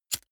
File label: lighter wheel spin